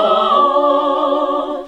SING, CLASSIC VOX1; Best Service; Hallelujah

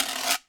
Quiro Scrape; Sonic Foundry (Sony Creative Software); Joe Vitale Latin Percussion

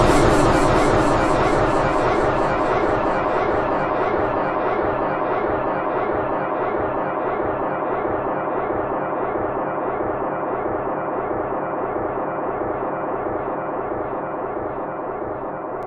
FX ekko; Sonic Foundry (Sony Creative Software); Ugly Remnants VOL-2; UR 2 FX (Long)

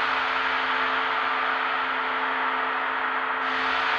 sparse soundscape samples; ReLooped

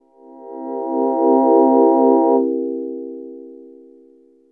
voice, dx7

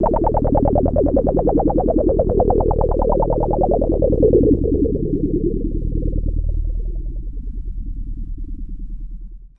el blurps, special FX twisted

